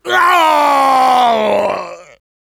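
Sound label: pain crticial death, French; Engineer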